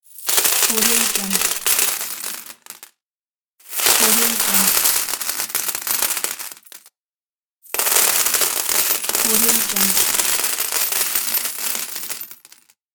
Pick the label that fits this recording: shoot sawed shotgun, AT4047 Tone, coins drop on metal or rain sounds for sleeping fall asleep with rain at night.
coins drop on metal